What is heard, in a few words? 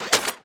Gun mech Reload